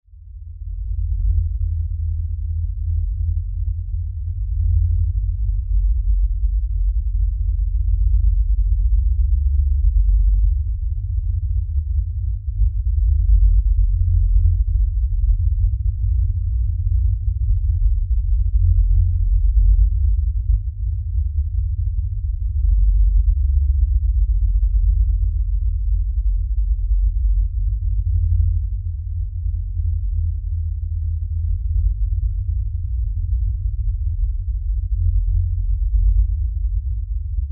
Lfe Drone Low Bass Rumble; Drones; Blastwave

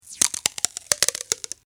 Sticky Tape; Tape Sound Effects; office

